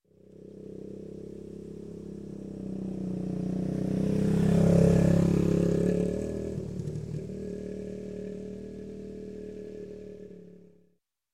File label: Motorcycle, Atc Pass By, Slow; Sound Ideas; Series 2000